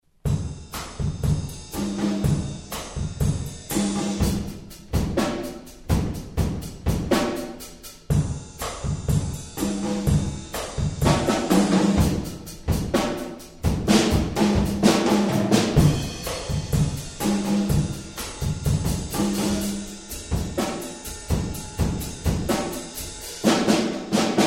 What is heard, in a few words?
flanging; C Alan Publications